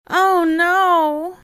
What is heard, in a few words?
error